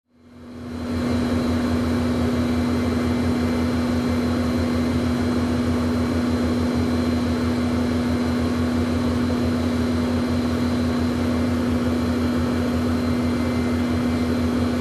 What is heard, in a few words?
Airliner Cabin Ambience